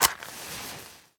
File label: Light Candle; Devour; The Town